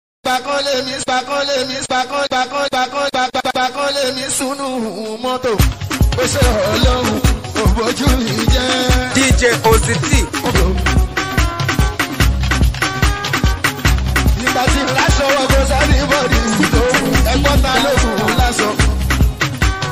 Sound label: HipHop Dancehall Version